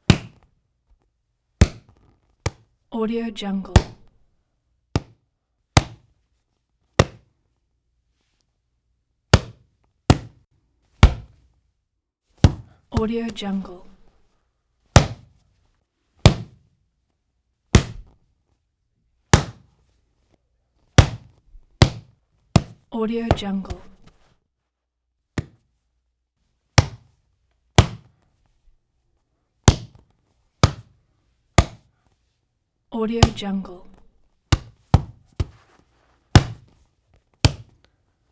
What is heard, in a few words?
sports volleyball volleyball game ball only; human sounds